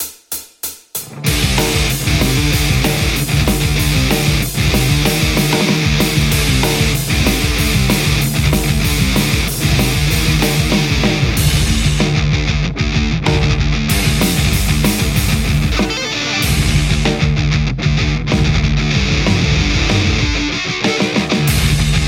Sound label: Les Paul; metal; poulin lecto